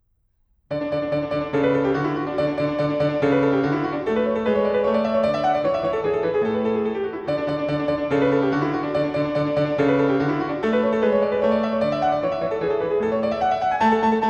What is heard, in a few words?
Anglaise in D major, piano; Ludwig van Beethoven; Brilliant Classics; Hess 61